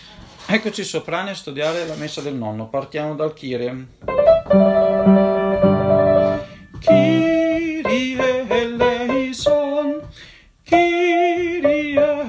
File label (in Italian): Kyrie Soprani; Santucci; Messa del Nonno